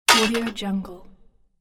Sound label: metal machine hit; industrial sounds